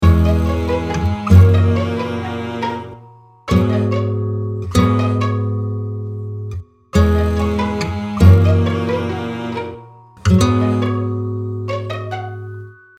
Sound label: Asian Classic guitar C